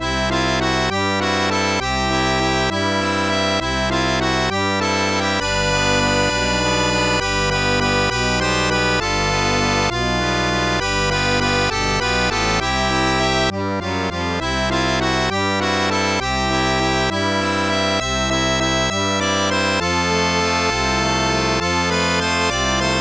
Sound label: ACCORDN